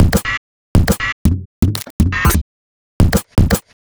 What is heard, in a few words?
Robot Thunder, Modular Electronica